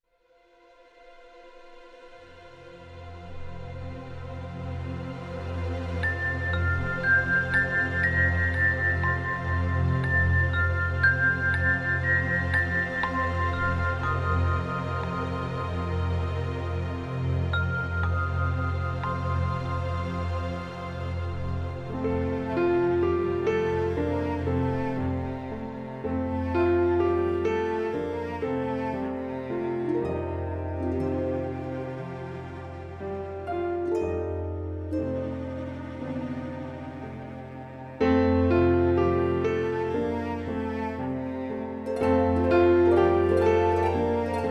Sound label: Instrumental